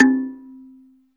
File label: KALIMBAS; Spectrasonics; Heart of Africa